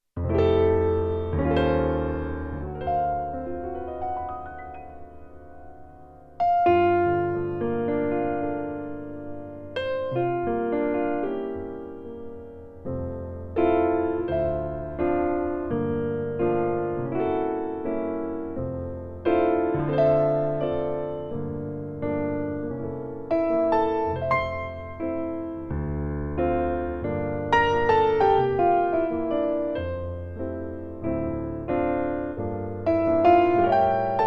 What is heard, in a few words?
Grand Piano D jazz